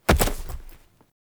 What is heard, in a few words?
Foley Action Default Body Fall, Default Clothing; Characters; Player